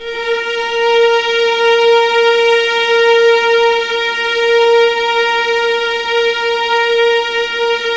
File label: A#2, Ultimate String Collection, VLAS EXP; LEG